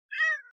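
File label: cat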